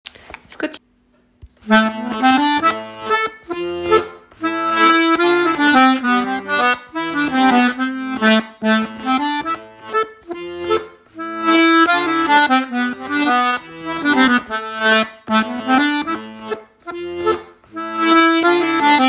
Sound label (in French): Scottish, accordeon